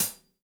Gretsch Hi-Hat Closed; Sonic Foundry (Sony Creative Software); Vital Drums

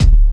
BD hip, Dance Mega Drums; Best Service

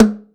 TOM, Drum Dry; East Collexion; Drum 1

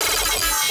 Bass Shot

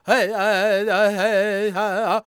C# MIN, World Voices, LAKOTA

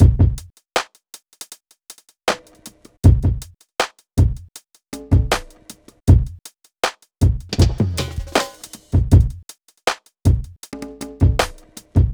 Drums, Hip Hop

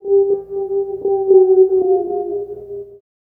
Record Stopper (Ghost Guitar 01); Sonic Foundry (Sony Creative Software) - Stylus Pressure Urban Grooves on Digital Wax

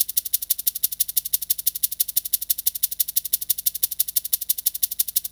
Shaker Loop; Sonic Foundry (Sony Creative Software) - Fluid Dynamics Drum & Bass; Construction Kit; Percussion

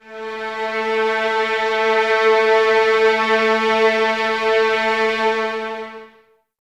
Violins A 3, String Ensembles; Light; Miroslav Vitous; 11 Violins

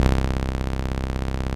505, String Machines

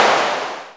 PRC CLAP; Roland L-CD701

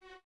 Violins F#4; Miroslav Vitous; String Ensembles; Detache

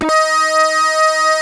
PPG D#4, synthesizer; Hollywood Edge; Giorgio Moroder Rare Synthesizer Collection; PPG.2.3

